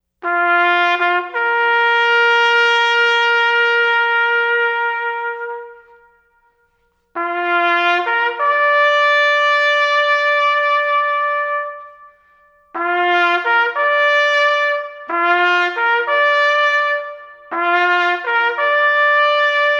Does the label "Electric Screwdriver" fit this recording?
No